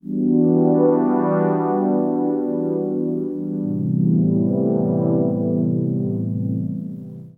Chilla Dream Pad; Serial Chiller

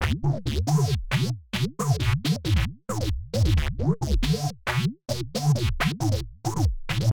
Squelch Bass

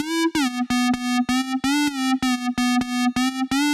Nice Dirty House SYNTH

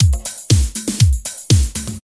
Loop

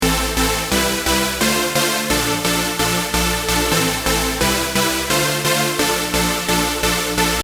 exciting chord climax; djduanewoods

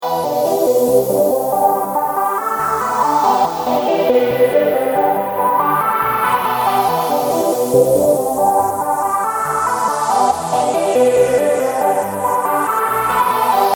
blue clouds

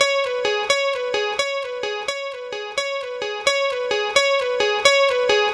Chilla Acid De La Playa Guitar; Serial Chiller

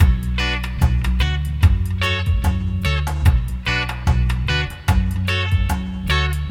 Skank Groove; sash

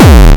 Loud Gabba Kick; Flaps

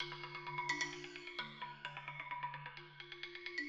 xylo

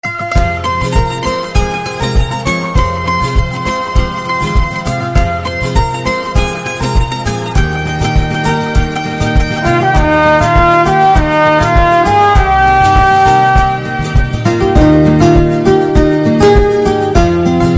india soundtrack

